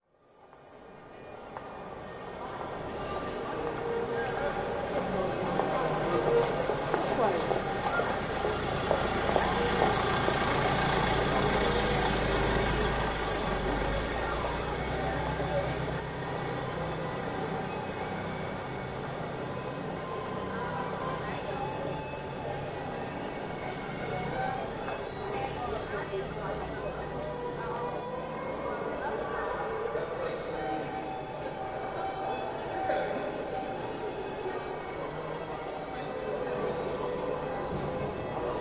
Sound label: soundscape lincoln high st